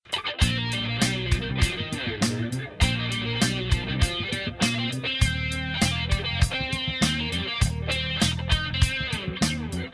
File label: Instrumental